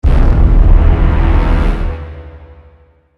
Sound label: Synth, Strings, Stab, Horror, 5.1; Sound Effects:Science Fiction; BlastWave